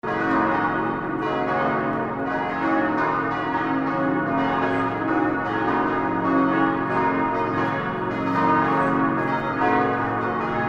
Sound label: church bells calling